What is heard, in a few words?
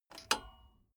bmx bike pedal foot release regular; sport freizeit